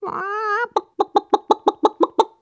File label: bock bock